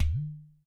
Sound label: Udu